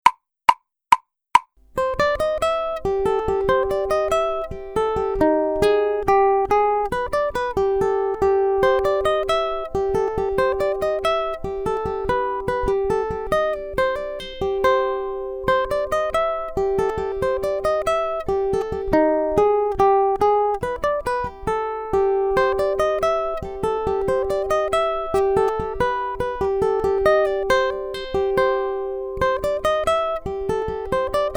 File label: Accompaniment, ukulele; grade8